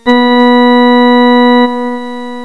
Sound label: ORGAN NOTE